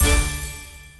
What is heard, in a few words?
symbol hit scatter